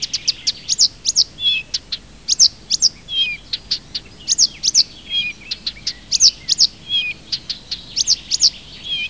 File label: Acrocephalus dumetorum; Камышевка садовая